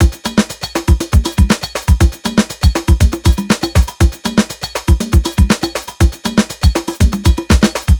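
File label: 120 beat busy, Nu Jazz House; PowerFX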